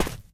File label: concrete, footsteps run; Left 4 Dead 2